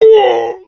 zombie hit; STALKER; customnpcs

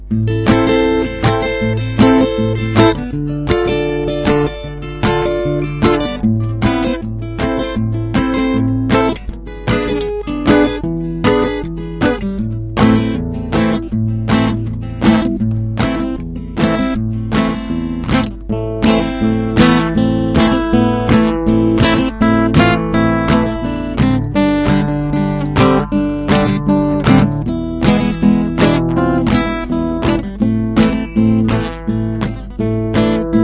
guitar; STALKER

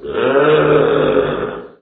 snork agroed howl, mutants; STALKER; customnpcs

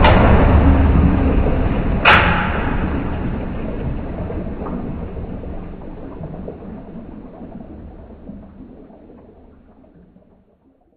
engine stop; STALKER; minecraft

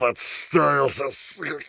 dolg hit; STALKER; customnpcs

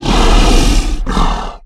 giant agroed growl; mutants; STALKER; customnpcs

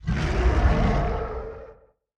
ambient mutant; STALKER

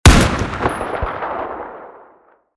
m24 fire; STALKER

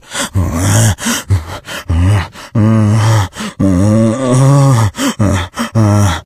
mzombie idle; STALKER; customnpcs

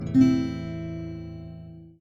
am accord, guitar; STALKER; customnpcs

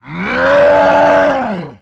mburer attack; STALKER; customnpcs

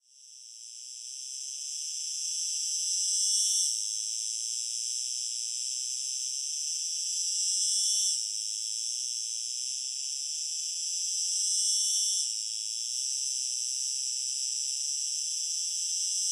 ambient cricket; STALKER

